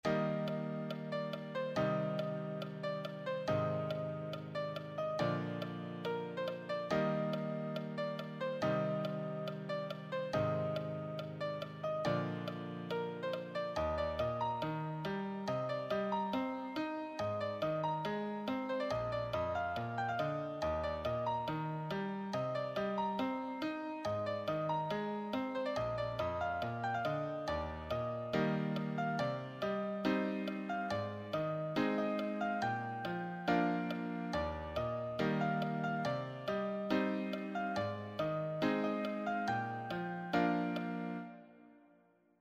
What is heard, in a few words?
solo, piano